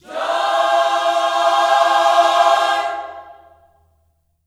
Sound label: JOY C MAJ, Gospel, Vocal; ILIO; Vocal Planet